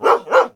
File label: idle, dog